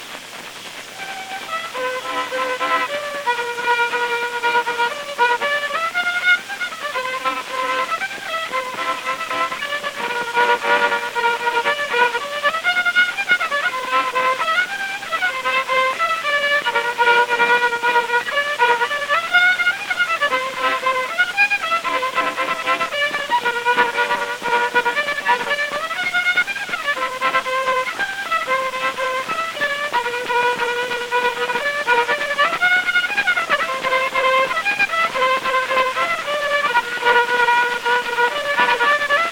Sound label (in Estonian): viiul, folk; Bruleik; Pruudilugu; Ruhnu